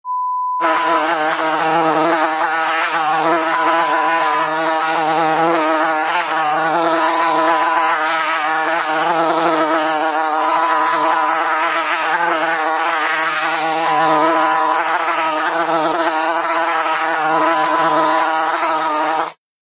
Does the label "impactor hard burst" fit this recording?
No